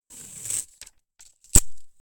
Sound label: duct tape